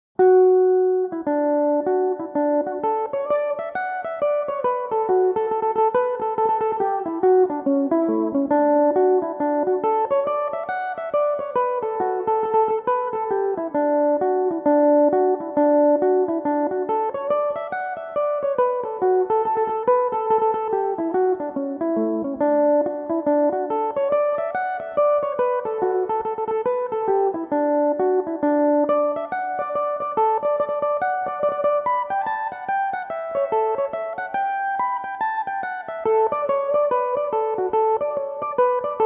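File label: hornpipe, D major, mandolin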